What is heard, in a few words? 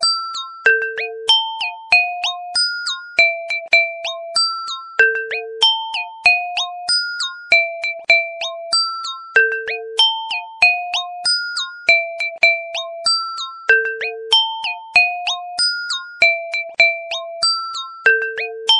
Soft iPhone Ringtone; ringtones